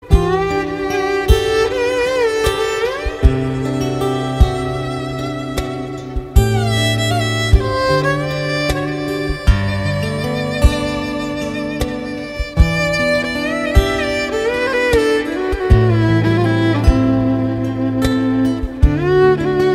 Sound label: violin tone ringtone